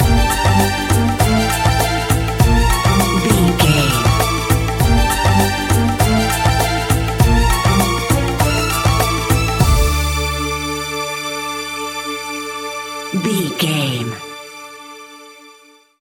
Arabian soundscape music bed, An exotic and colorful piece of World music. Groovy, powerful, exotic